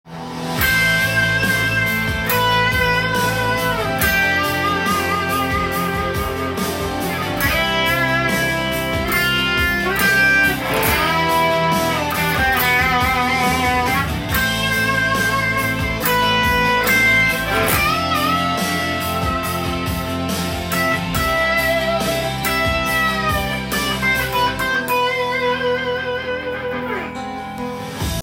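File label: simple rhythm solo, guitar; how to play ballads in a cool way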